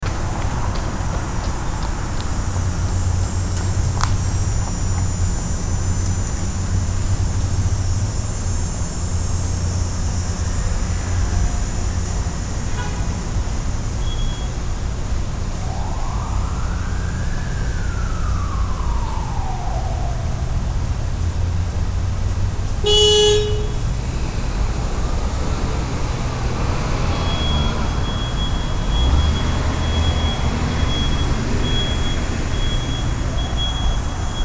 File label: urban siren horn truck back cicada